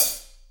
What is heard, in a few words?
HH, Roland, Real Kit; 90 s Sample CDs; Sound & Vision - Gigapack I CD 1; Real-Kit s 4